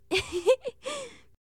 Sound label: Sex Lines Kiss giggle